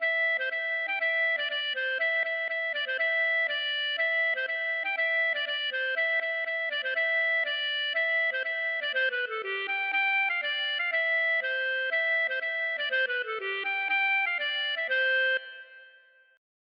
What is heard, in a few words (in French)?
maraichine